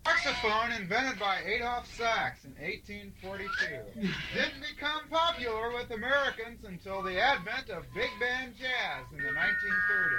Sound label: saxaphone